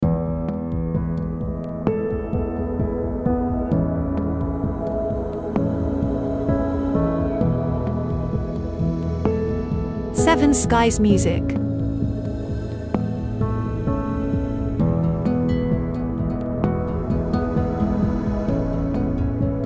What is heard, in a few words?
My Ambient Reality